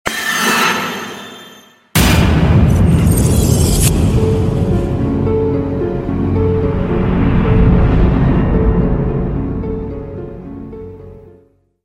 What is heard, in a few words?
horror sms tone ringtone